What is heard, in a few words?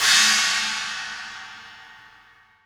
Sewer China Crash, processed; Sonic Foundry (Sony Creative Software)